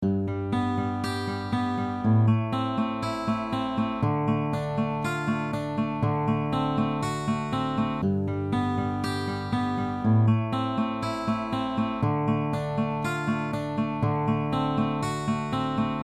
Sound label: string crossover